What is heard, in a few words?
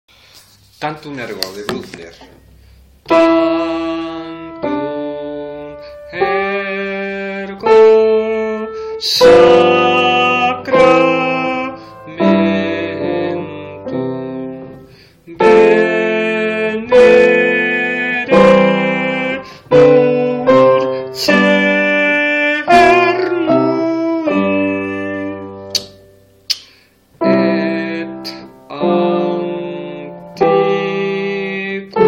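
soprano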